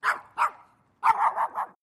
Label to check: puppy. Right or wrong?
Right